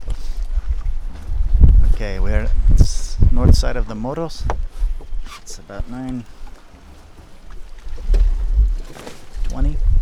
shrimp; Soundscape recordings; N morros; whales of guerrero

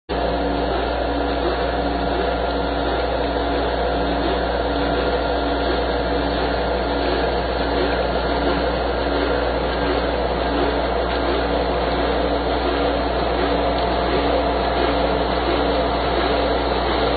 ship prop noise

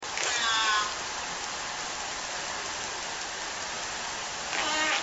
buzz descending

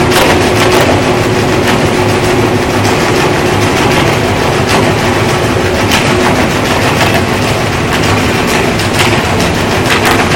container ships approaching; anthrophony; vessels

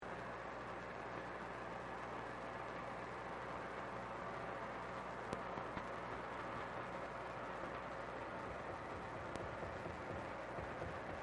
knocking, False KW; bush point

